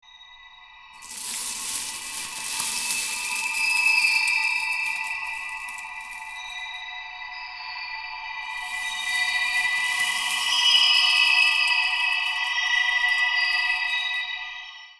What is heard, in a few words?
Coin